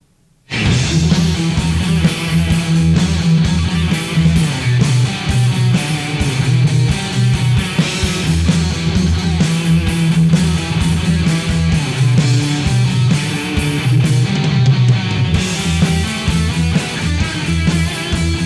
Guitar Solo Outtakes

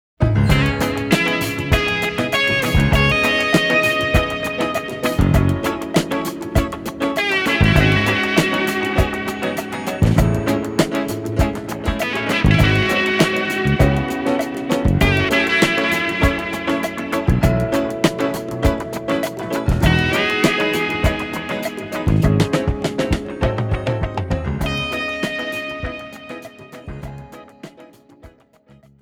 Guitar Jam

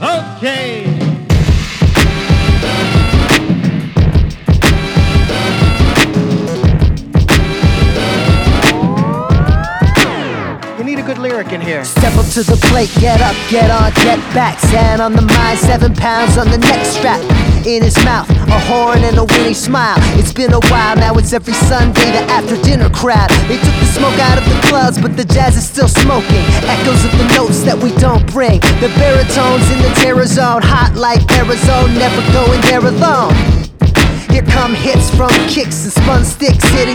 Jazz

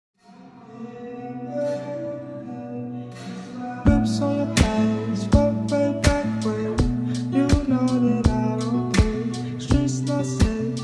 Instrumental

